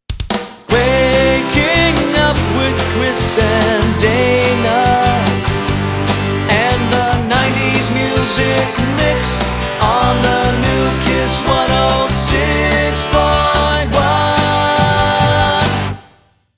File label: Jingle; kiss fm 106 1; seattle